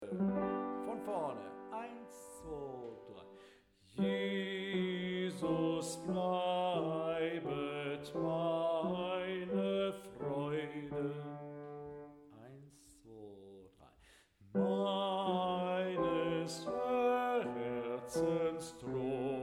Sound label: bass; Jesus bleibet meine Freude